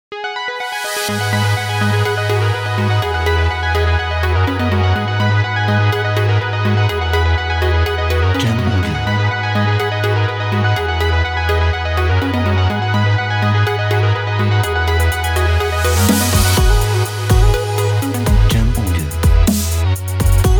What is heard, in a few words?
Dance Motivational Fashion